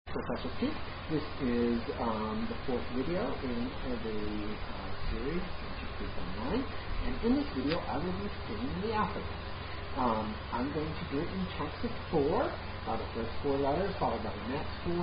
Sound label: The Ancient Greek Alphabet Sung, Tune Standard English Alphabet Tune